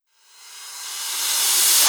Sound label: Reverse Cymbals